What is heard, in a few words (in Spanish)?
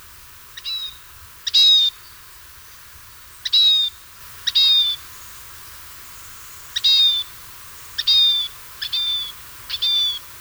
pinzon acanelado; Los Colores que Cantan